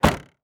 Truck GM Envoy Close Trunk; transportation

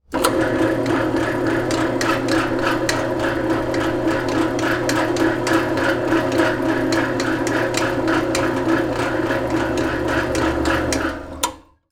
Industry Tool Drill Press On And Off

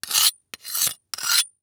Weapon Knife Sharpen Multiple Passes On Metal; AdobeCC

